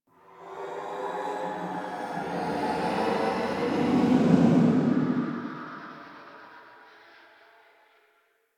Inhale Interior Small, Ambience

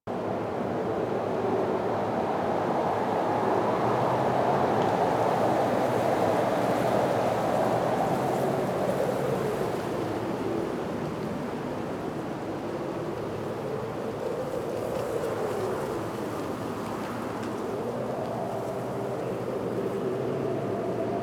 Cargo Rail Ext Amb; Warframe; Ambience; Corpus; Crp Cargo Rail

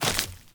Default Step; Characters; Steps; Sprout Farmers

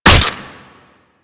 Barrage Hit Flesh; Warframe; Warframes

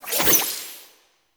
Mote Pick Up; Tau; Tau Focus Ultimates

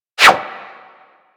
Bullet Parry Metal; Warframe; Bullet Parries

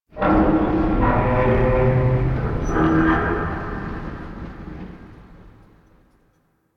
Ambient Tank Vocal; Warframe; NineteenNinetyNine

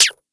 Rifle Mech; Warframe; Weapons; Wisp Rifle

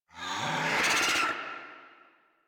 Infested Charger Run Breath, Enemies; Warframe

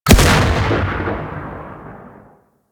Anti Aircraft Gun Projectile A; Weapons; Warframe; Archwing

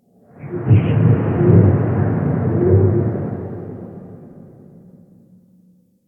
Interior Wind Howl, Ambience; Warframe; Duviri

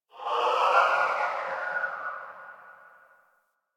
Gas City Distant Wind Textures, Ambience; Warframe; Corpus Gas City

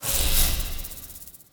Heal; Powers; Banneret

